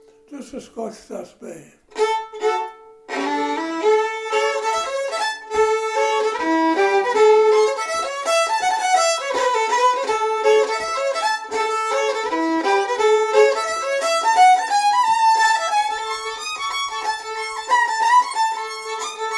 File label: strathspey